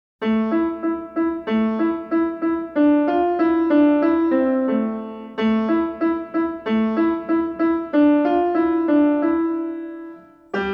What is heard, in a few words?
piano